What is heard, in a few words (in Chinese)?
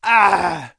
男 受伤; renlei